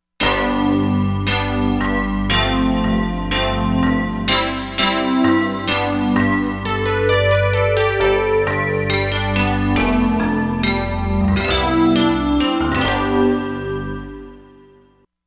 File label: Metal Snap, Korg MSC-07S Synth; Korg MSC-07S Synth 2